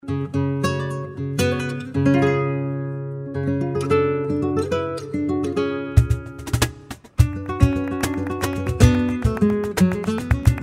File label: bulerias, gitarre flamenco